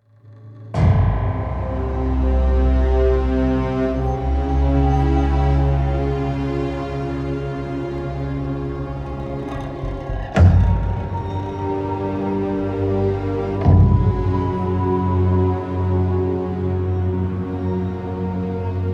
Suspense; Marcuria

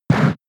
Hit Weak; attack moves; Pokemon; gen 6; XY; ORAS; Hit Weak Not Very Effective

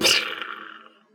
rocket hit

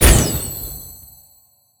archer shoot light arrow

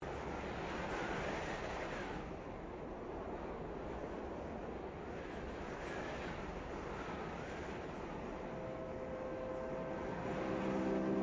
xmas ambient; Angry Birds Seasons

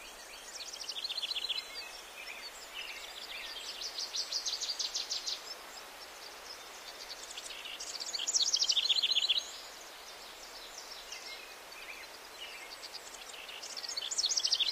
Puzzle Ambience; gamerip; Hay Day Pop

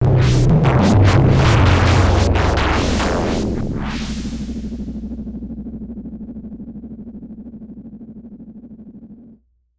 Alien Tube Destroyed

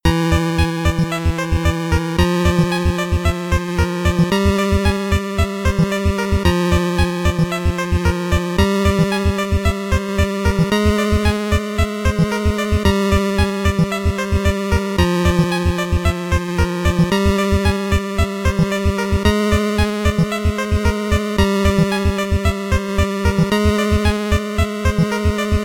BGM LAST BOSS 8bit; LASTBOSSDEMO; Mighty No. 9; 8-bit soundtrack

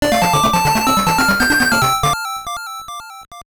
Jingle; Tatakae Pro Yakyuu Twin League 1995 Game Gear

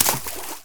Footstep water light; 1916 der unbekannte krieg gamerip

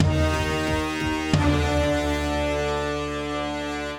Jungle End Stinger

